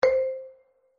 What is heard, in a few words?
countdown